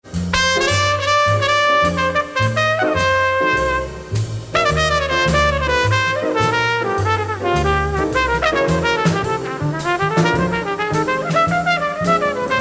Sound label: kicks light